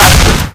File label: melee cricket bat; weapons; source engine; left4dead2